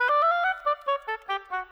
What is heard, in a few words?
Oboe, Orchestral; Sonic Foundry (Sony Creative Software); Orchestral VOL-2; Modern 13